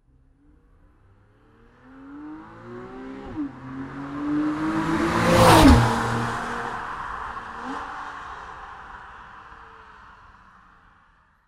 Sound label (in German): 911 carrera s vorbeifahrt; 911 991